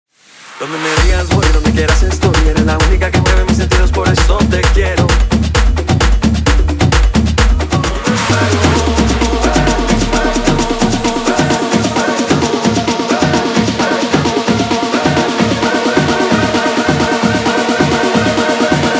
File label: guaracha remix 131 bpm